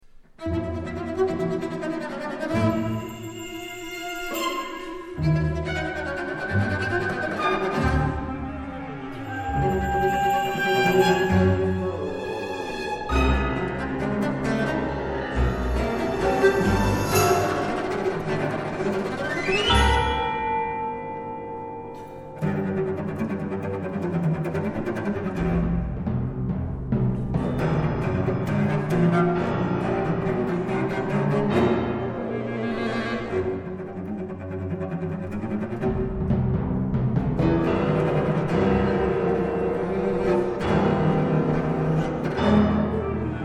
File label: cello concerto